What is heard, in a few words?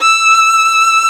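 Vio legato E5; Digital Sound Factory Virtuoso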